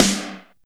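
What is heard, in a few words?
MPC snare, drum kit; USB Soundscan vol.03 - Pure Electro; AKAI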